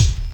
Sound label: KIT, Dance Mega Drums, HIP